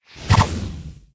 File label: Bow; Conquer Online 2.0